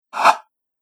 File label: Rough Swipe, whoosh